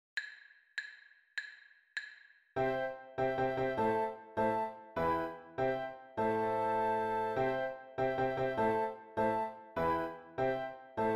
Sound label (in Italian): marcia schiaccianoci 100bpm; tchaikovsky